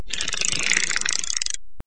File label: Reload tac15